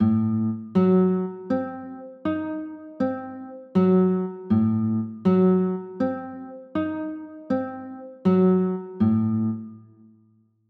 a dim7, chords

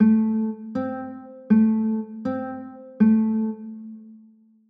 a m, chords

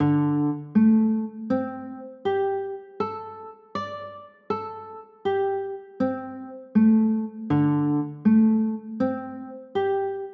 d sus4, chords; d 7sus4

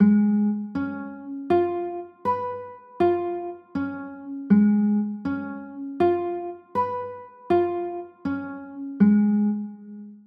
d flat 7

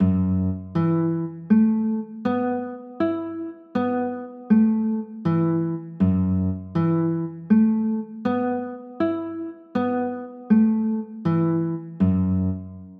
f sharp m11

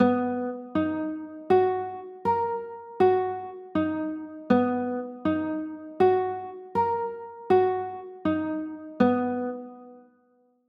b maj7, chords

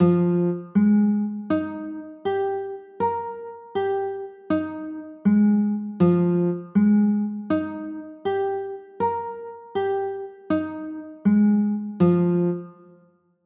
f m11, chords

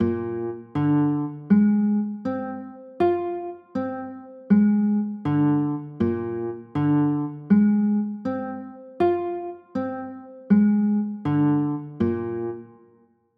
b flat 9, chords

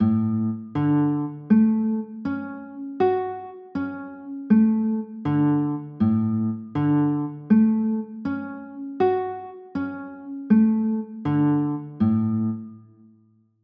d maj, chords; d maj7

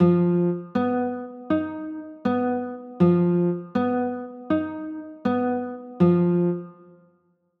f sharp 9; f 7 sharp 11

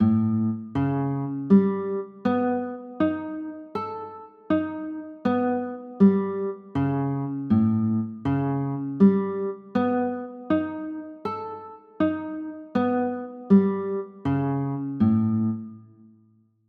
a 9b5, chords

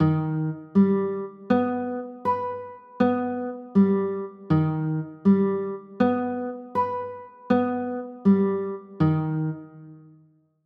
e flat aug sharp 11, chords

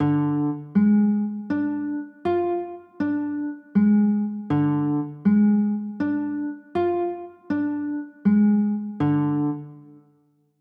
d dim, chords

